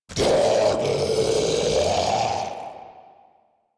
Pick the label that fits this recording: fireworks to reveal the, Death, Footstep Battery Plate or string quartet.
Death